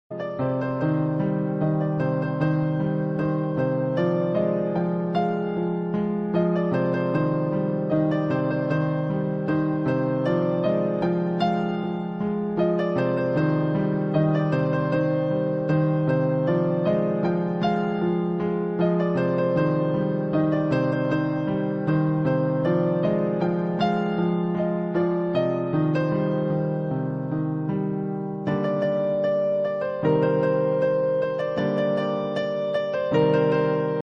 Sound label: piano ringtone